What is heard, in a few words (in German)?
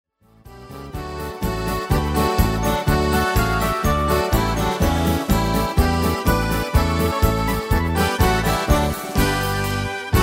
Boehmisches Medley